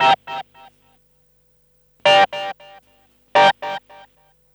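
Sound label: DNCE SYNTH, 115 bpm; USB Soundscan vol.32; AKAI; Roots Disco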